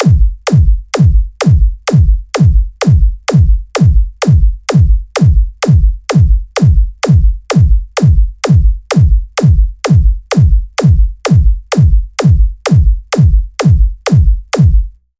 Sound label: Beat Viking 128 bpm